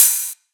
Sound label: juicy open hat c key